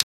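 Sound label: Clapboard Hit Single; clipboard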